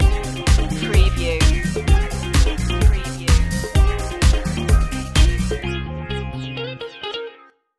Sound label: pop city stinger